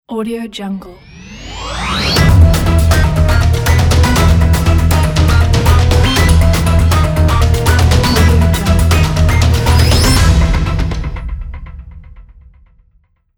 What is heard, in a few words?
futuristic hitech introduction logo